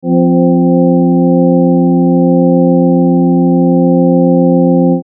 accord quint

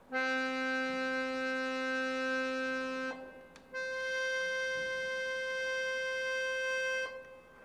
accordeon octave

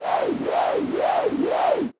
wawa bruit

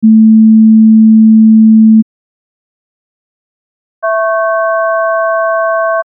filtre rect

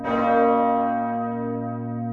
grande cloche

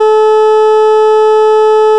scie

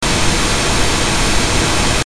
bruit rose

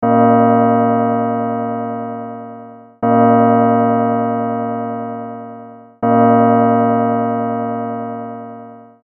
cloche

